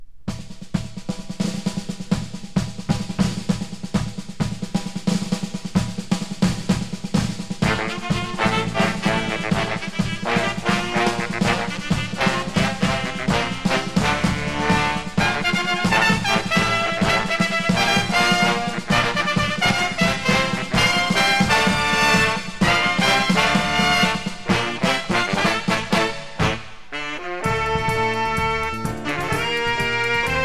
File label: jazz ensemble; denison